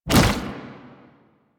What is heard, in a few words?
Tank Infested Arm Move Fast; Enemies; Movement; Lasrian; Warframe